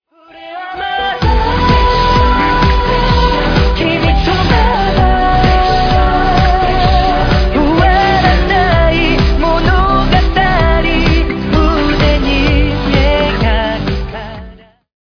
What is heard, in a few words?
Japanese Version